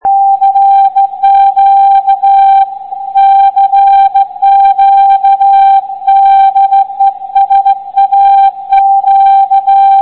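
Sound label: CQ 500 60 Hz loop antenna; saq grimeton radio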